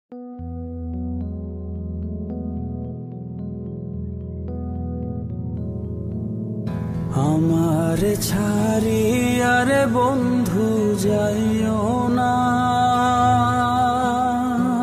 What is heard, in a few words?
bangla sad